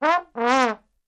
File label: Trombone Wolf Whistle